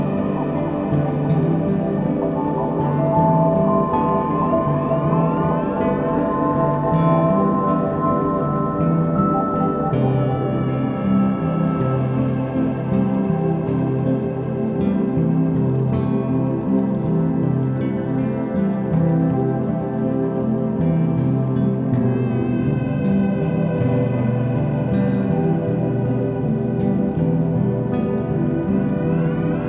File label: dragon fish